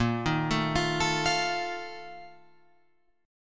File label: Bb Major 7th Suspended 4th, Guitar, Open F; BbM7sus4 arpeggiated